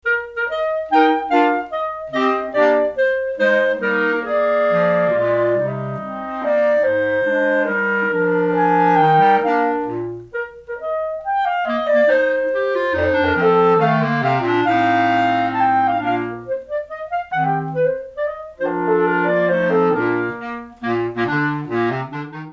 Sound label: Quartet, clarinet; Crusell